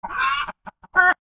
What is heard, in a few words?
chicken